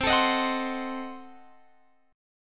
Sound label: C Diminished 7th C, Guitar Open F; Cdim7 strummed